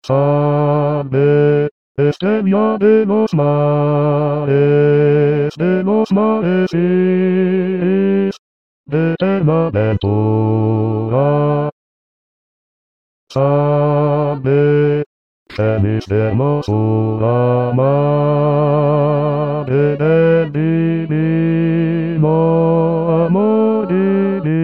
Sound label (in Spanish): salve marinera bajos